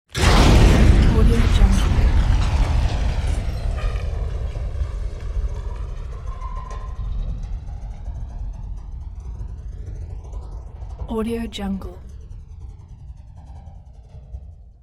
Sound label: concrete and steel building collapses